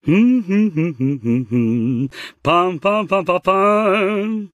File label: singing